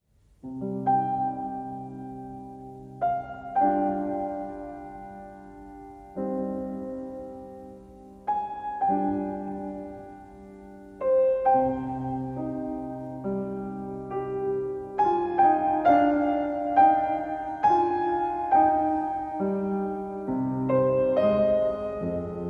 Only Piano

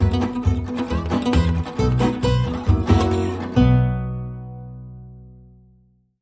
Fast Blues Swing

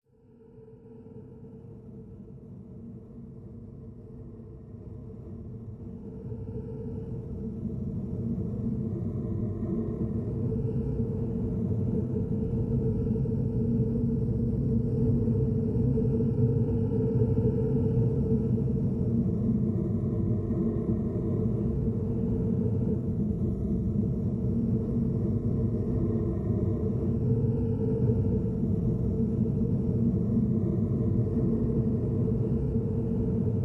Drone Noise

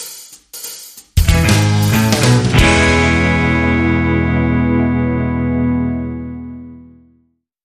Jazz Style

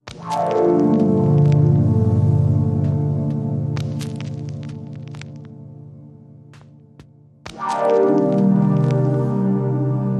Deep Chill Mantra Inst

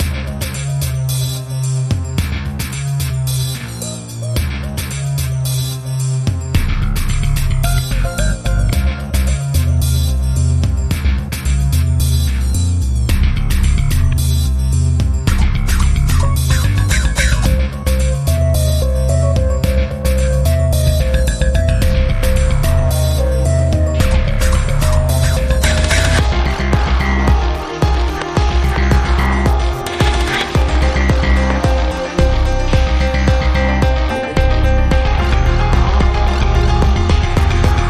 Techno

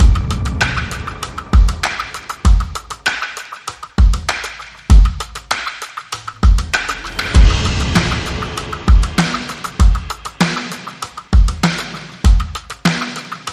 Drums and SFX

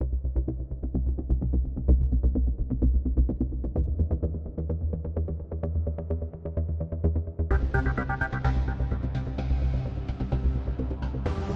Quest Drone No Rhythm

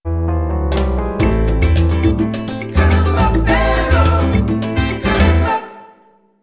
Sting Vocals

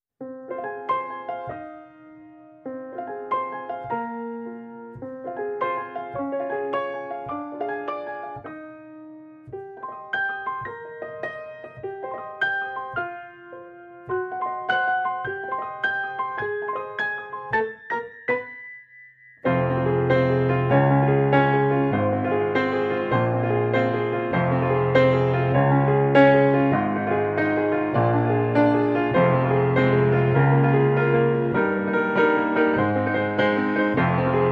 Piano

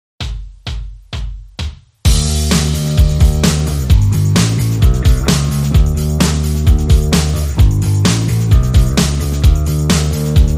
Texas Guitar

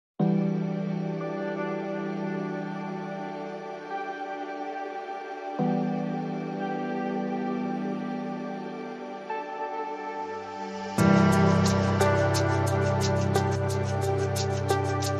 Reduced Drums Reduced Arrangement

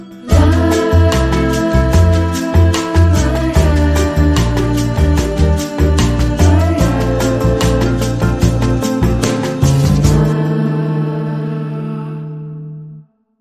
Dreamy Bossa, Sting

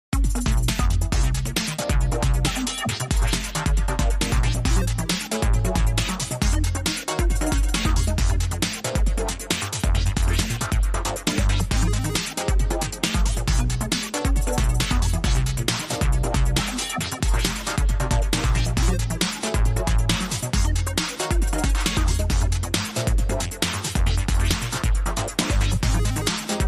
Electropunk

